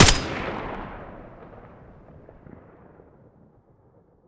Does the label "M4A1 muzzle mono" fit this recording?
Yes